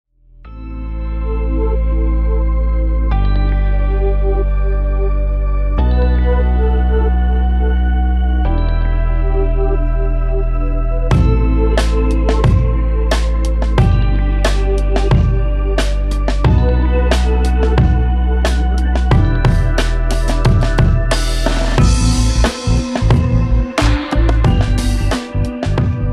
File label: Boom Trap